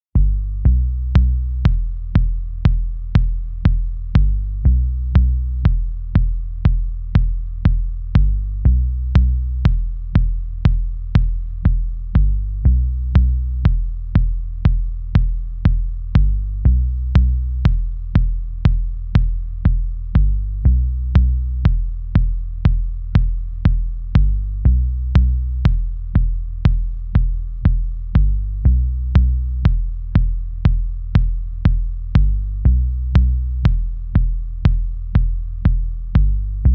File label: Kick and Bass